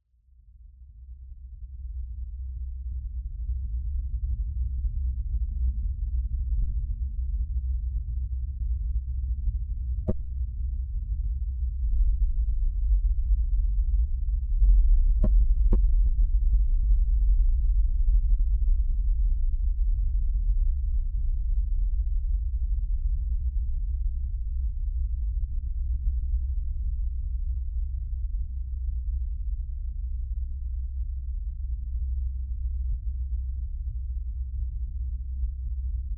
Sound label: Quake